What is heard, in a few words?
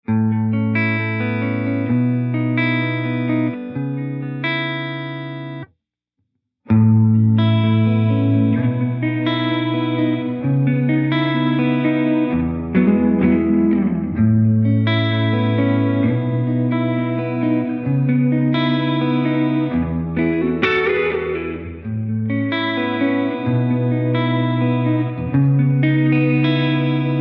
Modulation Chorused Reverb Fast